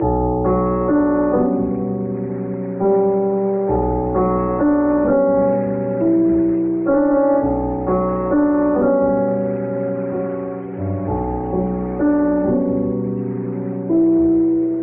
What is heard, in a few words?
piano quiet, sad loop; loops